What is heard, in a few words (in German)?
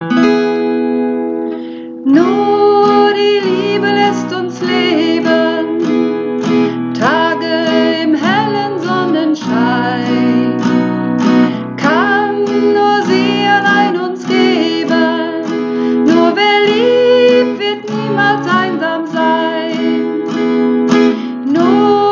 Hauptstimme, Uebungsaufnahme